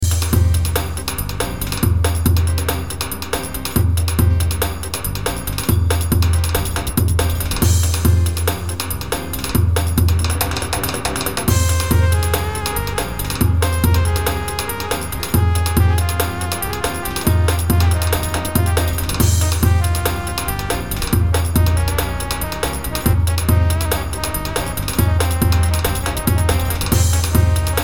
kapilo, korg pa500 9 8 davullu; Korg PA500 TR

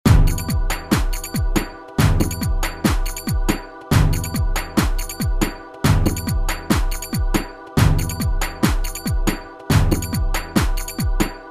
romanic ritim deneme, gw8 drum kitlerle ritim

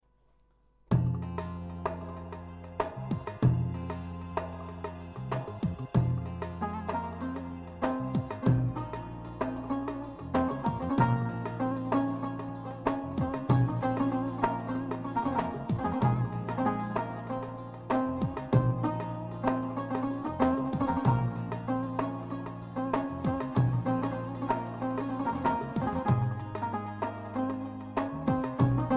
ordunun dereleri, korg pa 55 baglama ney

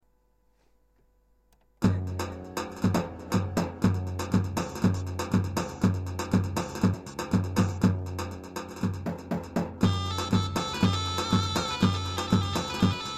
zurnalar, korg pa 55; ses ve ritim demolari

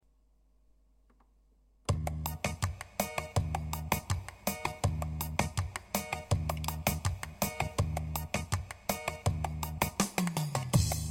topal, pa 500 oyun havasi; turkiye

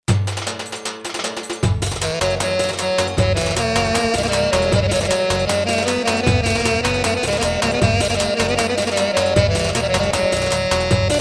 cifteteelli

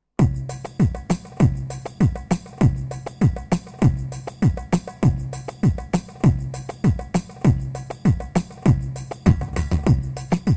pa-500 tr ritim, 2-4

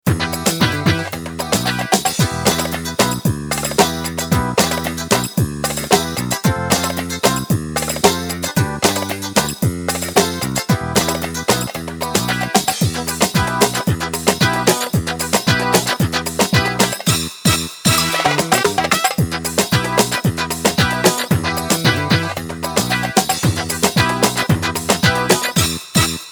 2-4 pop ritim

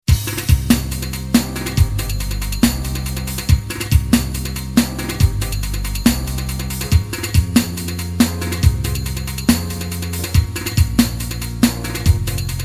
duble rtm, roland gw8

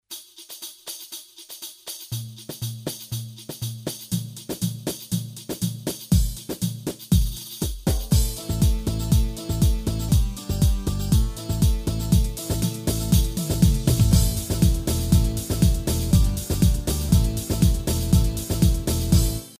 ilahi, yamaha psr a300tr v2 ritm

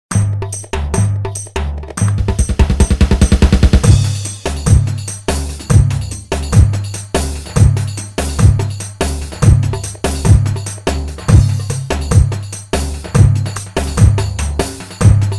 gw8 gamzeli ritim; gw8 drum kitlerle fl9 ritim convert

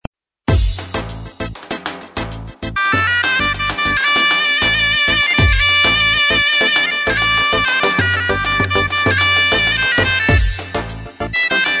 halay; tr orjinal

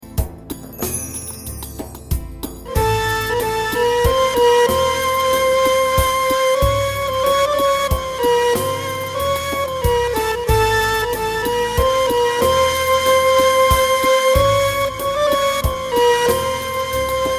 korg pa500 tasavvuf; demo calismasi

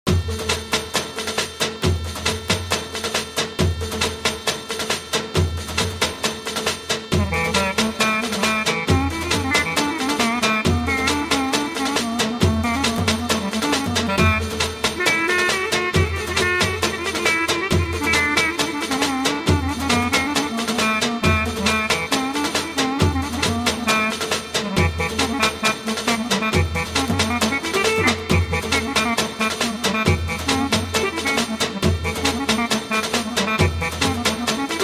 kandira ciftetelli

